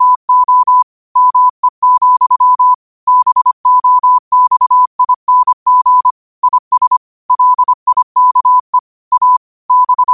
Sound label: 5 wpm, morse